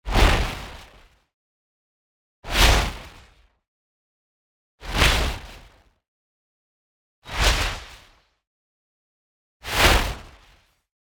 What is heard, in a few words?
WHOOSH Static White Noise Swoosh; boom library; Anime Essentials